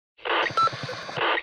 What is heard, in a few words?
chopper sound